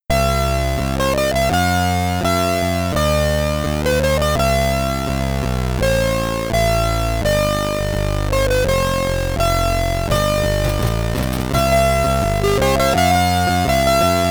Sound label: Name Entry, SID Stereo; Gemini Wing; Commodore 64